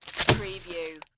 Garbage Can Throw Trash

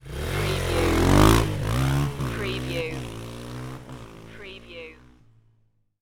Motorcycle Dirt Bike Drive Away

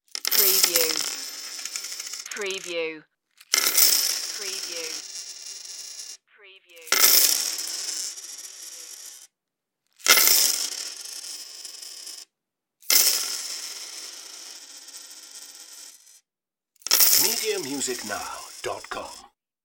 put coins on table

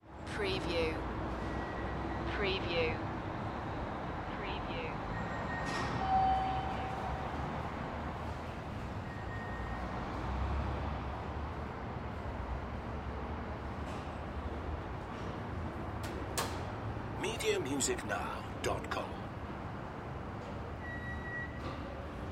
Parking Structure

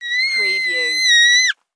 Child Scream; Human Sounds / Other / Misc Sounds

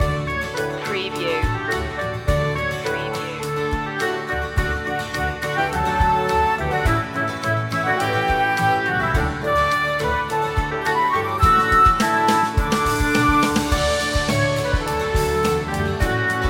orchestral loop; joy to the world; media music now

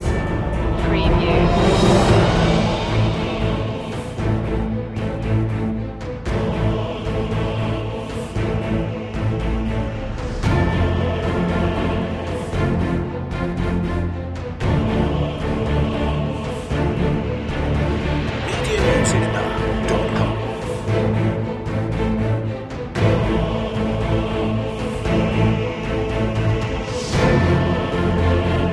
soldiers of fate with choir low intensity

